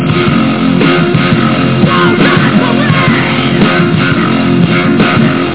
BARMUSIC

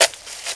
shovel; outlaws

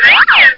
whoopie

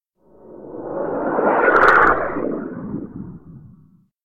airstrike; Carnage Contest

